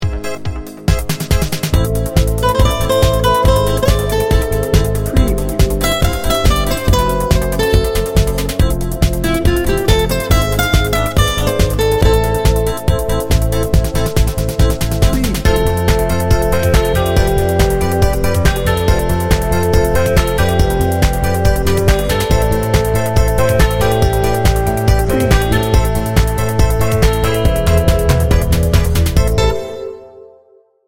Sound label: fashion pulse